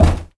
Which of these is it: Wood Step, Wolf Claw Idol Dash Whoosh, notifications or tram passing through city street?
Wood Step